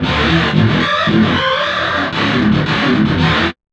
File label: GTR, Chemical Beats